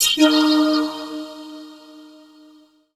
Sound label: VOICE FX, Dance Vocals